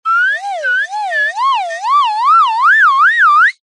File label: cartoon slide whistle ascend climb wobble